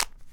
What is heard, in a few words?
Big Cube - Click, Rubik's Cube